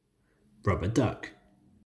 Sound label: rubber duck; how many toys